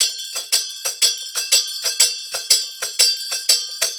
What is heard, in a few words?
120 perc loop cymbals downbeat seamless; TKO